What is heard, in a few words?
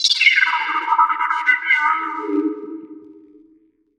Cave Pan; FREE SFX PACK VOL 1